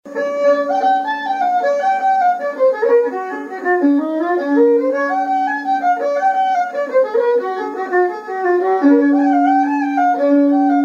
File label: Jig; Cliffs of Moher